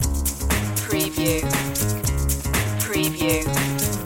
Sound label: swing loop